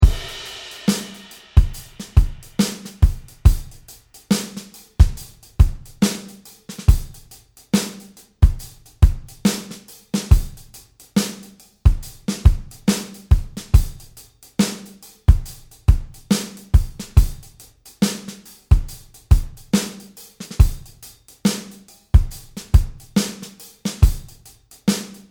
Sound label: shuffre, guitar